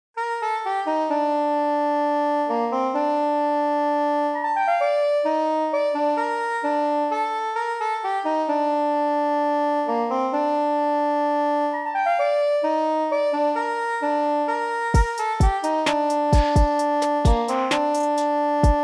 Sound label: brass soprano